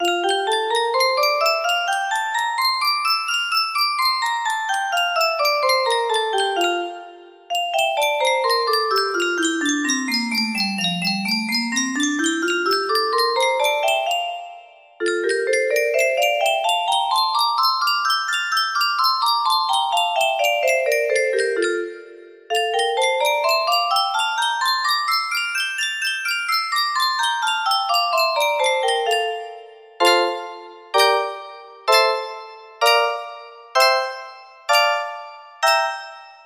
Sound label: F major, melody